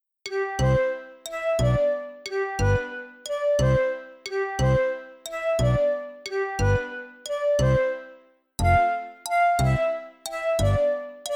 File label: cooking music